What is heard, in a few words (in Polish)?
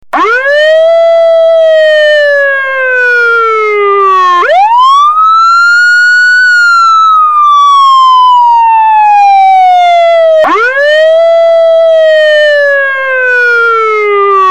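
sygnał wilk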